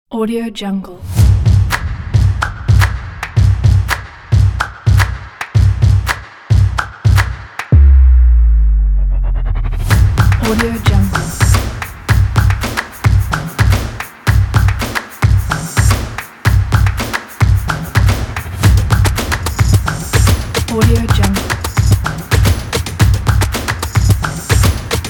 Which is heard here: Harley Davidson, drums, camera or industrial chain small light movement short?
drums